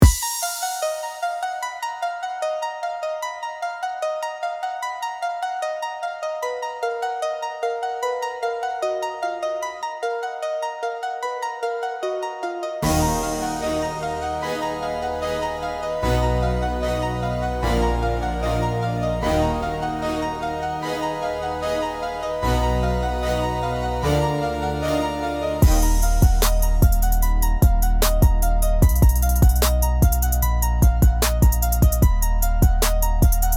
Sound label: Rap beat bassline